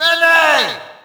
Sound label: Shout, audience; UFC Tapout 2